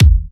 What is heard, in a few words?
Bassdrums; Vengeance Samples